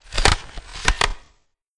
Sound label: Grabpack Switch Hands; grabpack upgraded; pp3